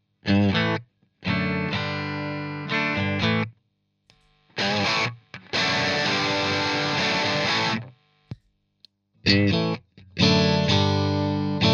Screamer CH1 negativ 12 Tone 3 Gain all positions Riff; JHS